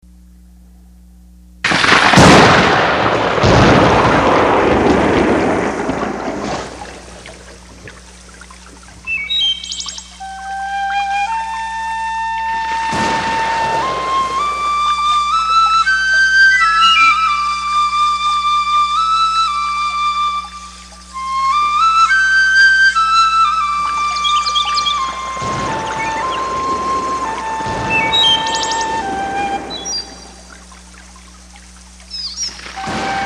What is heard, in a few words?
music rain forest